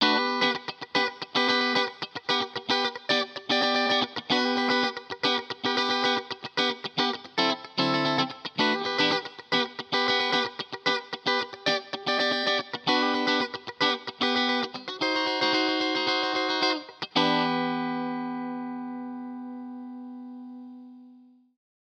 OX Amp Top Box test Fender funk 1x12 black d-lux hall comp eq; universal audio